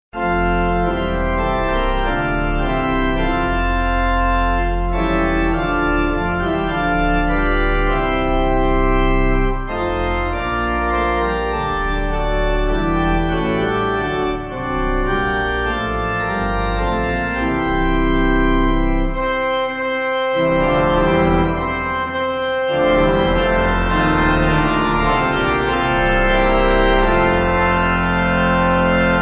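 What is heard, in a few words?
Pipe Organ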